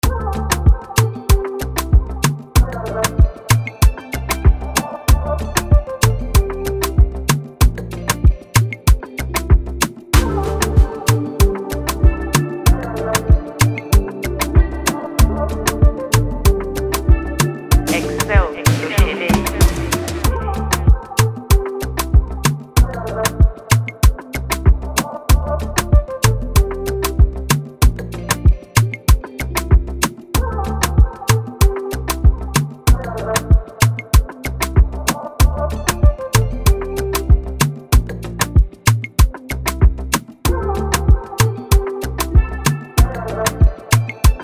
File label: BEAT